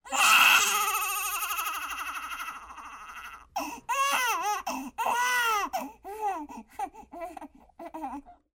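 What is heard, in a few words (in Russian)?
истерика; Плач новорожденного ребенка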